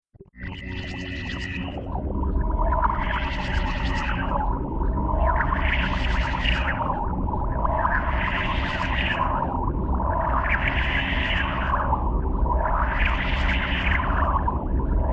Drone SciFi Alien Atmosphere Pulsing, Drone Scifi Alien Ambience Atmosphere Tonal Pulsing; Warble; Drones (Sound Effects:Sound Design)